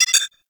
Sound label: Mini Moa Beep Slow; Warframe; Corpus; Arisoctrats